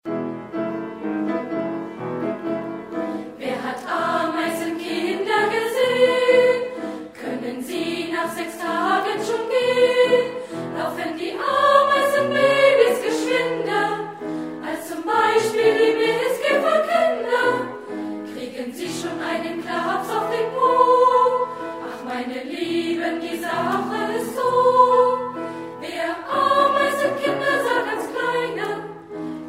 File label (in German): Chor; Wer hat Ameisenkinder gesehn